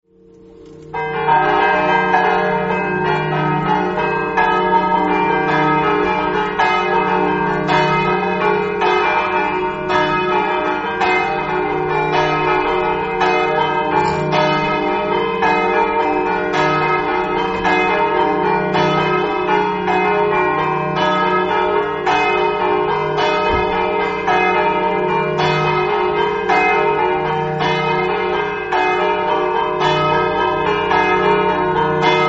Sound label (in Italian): campane; manzano